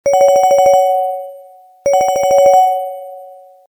Ringtone smartphones, ringtones; S300; Nobby

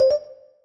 disconnect; Xiaomi Redmi 2; ui

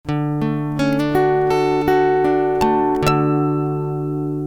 SMS Tone, notification; WE V1

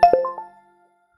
Nokia Message

